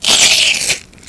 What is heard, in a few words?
bite, headcrab poison; hl2